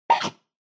Motion Eating

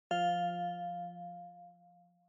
OS Sound (Speaker-Notification)

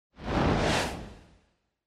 Camera Motion Dry; シーン・カメラモーション

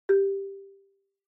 Xylophone, Single

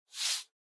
Hand Foley Stroke

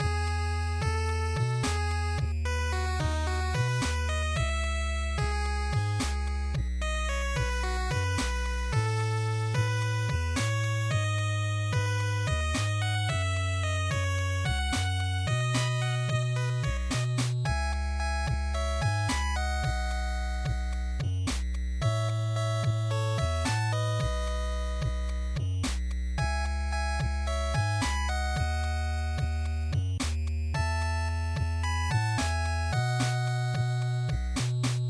GB Sports ED Loop110

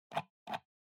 PC Mouse Scroll Fast

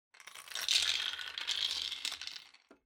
Drawing Machine Garapon Few Spin

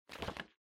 Calendar Noise

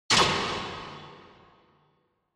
Spotlight

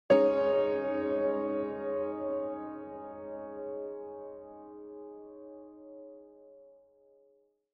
Piano Long

